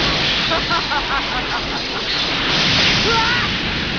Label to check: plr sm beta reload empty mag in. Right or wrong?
Wrong